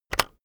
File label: keyboard external enter press very fast heavy